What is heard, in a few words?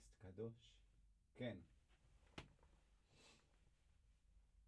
Piano Low